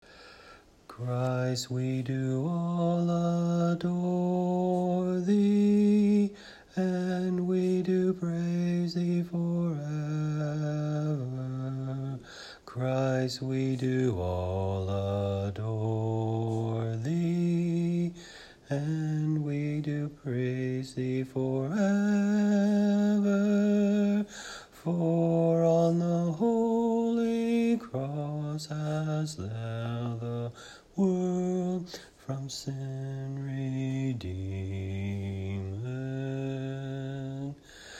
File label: BASS; Christ We All Adore Thee